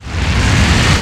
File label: Enemy Bigflame Active Shot Motion